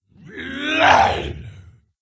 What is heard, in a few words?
Attack; General Vezax